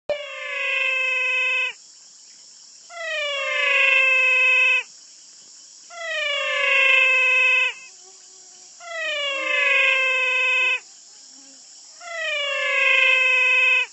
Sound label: Whistling frog Physalaemus albonotatus